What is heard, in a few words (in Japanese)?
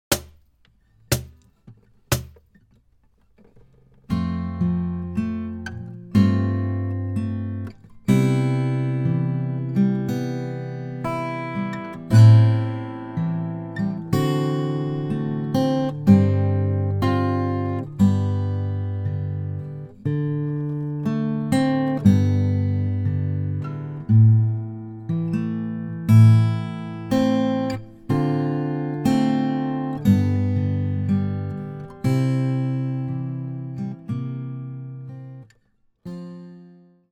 ギター伴奏; 30秒